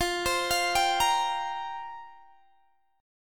F Suspended 2nd Suspended 4th, down, Guitar, Open G